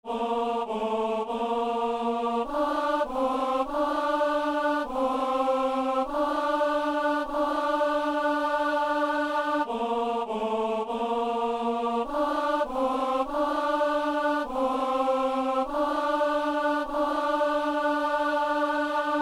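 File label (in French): ténor; messe de san lorenzo; doxologie du canon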